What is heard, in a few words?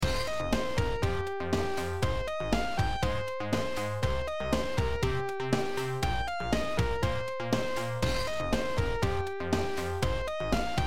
bass basstone lead sound beat